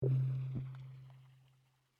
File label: clic